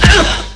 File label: fall; quake2; mcclane